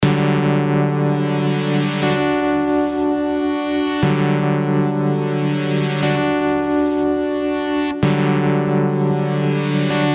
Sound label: Retro Synth Chords